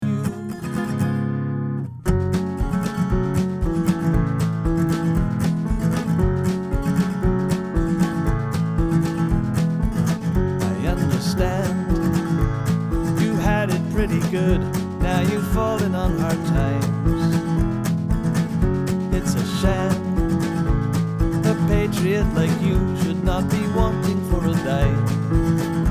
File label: bass part; Bass part (played on piano)